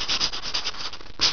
scribble

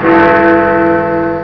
BIG BEN; ALARMS

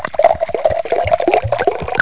water

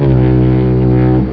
FOGHORN; ALARMS

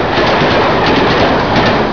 RAIL CAR; TRANSPOR

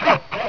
SAW METAL

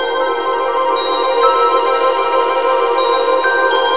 DREAMY

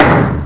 knock big; IMPACTS

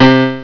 piano

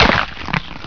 CRUSH; IMPACTS

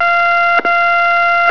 TEL BLEEP; ALARMS

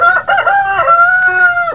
cock a doo; ANIMALS

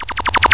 FLIBBER; EFFECTS

